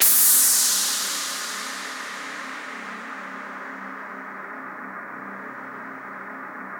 VOICE PAD; Masterbits; Vintage Voltage

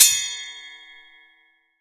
SPOKE HH, SPOKE HI HAT

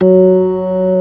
ORG.3 FIS3; B3 FULL

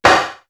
SONIK CRAK, PROC.PRCS; East West - Global Sounds

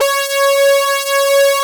SYN, Roland JD 800

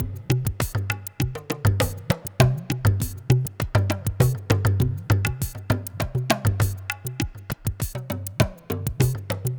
Ethnotronic, Processed Percussion

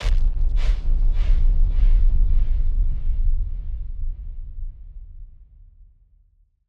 Hit Splosion; musicradar; bomb factory

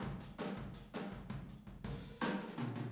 Boogaloo Fill Room ST, 82 BPM, Drums; Sonic Foundry (Sony Creative Software) - Drums from the Big Room The Mixes

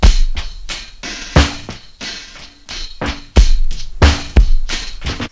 Ending breakbeat; jazz jackrabbit 2

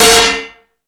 Rap Session, E-MU Pro-Cussion

